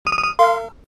victory; singe; Danmachi.daphne

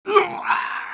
death; mohaa; dialogue

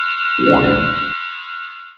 laser designator; cdogs; funwithguns; techdemo

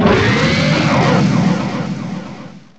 spider scream; hurrican

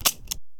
gun empty; singe; Crime Patrol 2 Drug Wars Singe 2

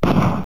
tommy shot; singe; WSJR HD

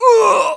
hit, pain; mohaa

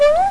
headcrab attack; xash3d fwgs

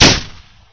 gun; pygame; pygun

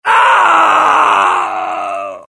death; mohaa; dialogue

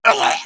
damage; mohaa; dialogue

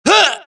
death, dialogue, Allies; mohaa; Mission 3

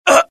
death, pain; mohaa; dialogue

